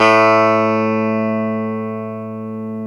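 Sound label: E 12 String guitar; Club-50 - Foundations Roland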